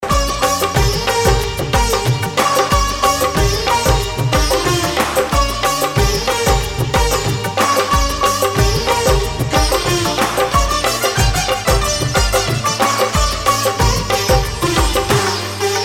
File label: Classical Music